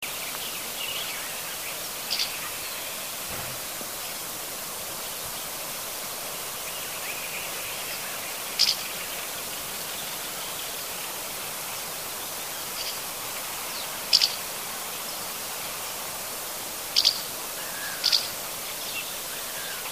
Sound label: Delichon urbicum